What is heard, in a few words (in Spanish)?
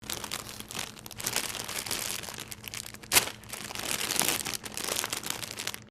sonido abrir bolsa papas; personas